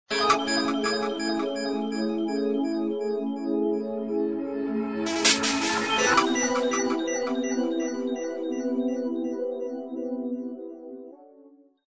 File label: ringtong; Gionee GN205